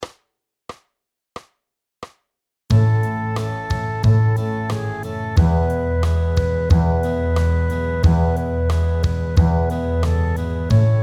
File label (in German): kontrabass